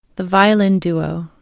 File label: violin duo man